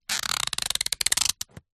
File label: foley plastic bag very thin rub hand squeak; zapsplat